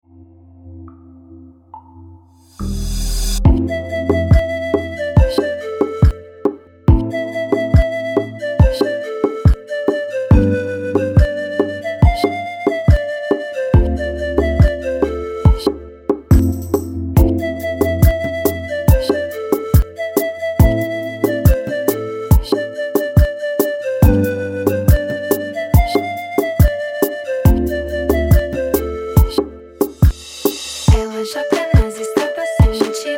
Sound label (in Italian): Canto